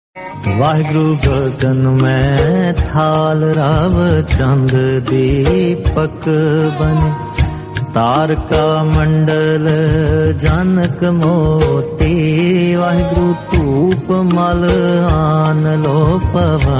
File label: aarti punjabi song